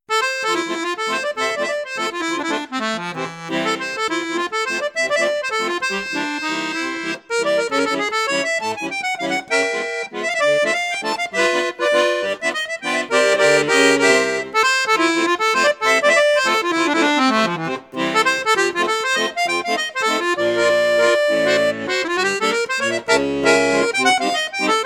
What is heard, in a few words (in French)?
registre flute, accordeon; maugein octavia